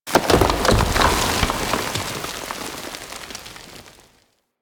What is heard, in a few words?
Orb Falling Debris; Warframe; Ambience; Venus Open World; Objective Rooms; Venus Obj Deck Twelve